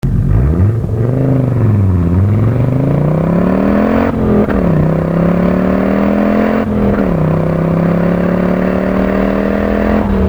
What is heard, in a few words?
audi ur-quattro 10v